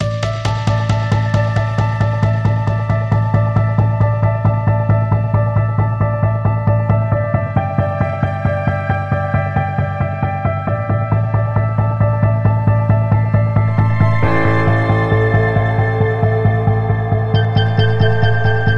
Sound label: No Perc